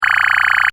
Space fx loop, Spaceship Environment Style Loop, Short; Sci Fi Sound Effects; Sci-Fi (Sound Effects:Science Fiction); Stuart Duffield